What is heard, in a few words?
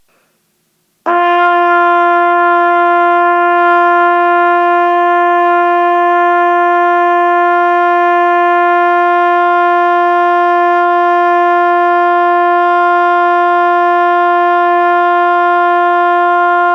Long Tones - Mid G, trumpet